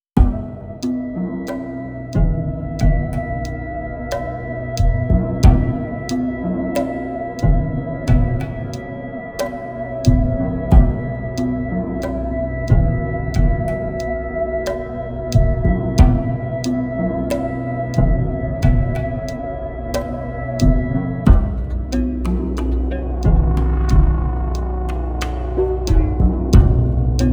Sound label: Scary Cue